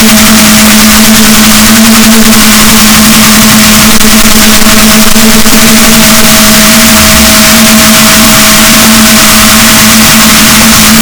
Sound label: rorquals, Balaenoptera Physalus, boat